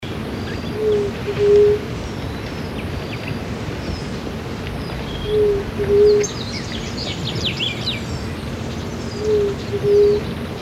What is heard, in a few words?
Yerutí Yungueña, Leptotila megalura, Large-tailed Dove; cantos